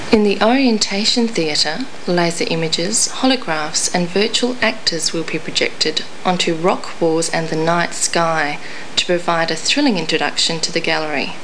sing; Mission To McDonaldLand

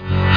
Phase In; Concentroids From Planet X

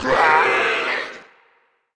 gobli vox die

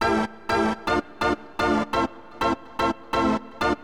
SYNTH HOUSE 125 A; eJay; Keys

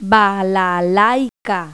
BALALAIK; Pipo-Aprende musica con Pipo

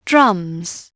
drums; Dorling Kindersley My First Incredible Amazing Dictionary

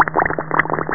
bubble

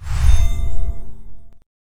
score victory

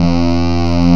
analog

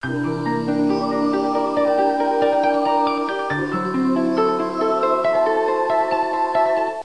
end music 1 - new loop; Pronto

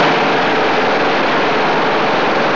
machinegun; horrorsample

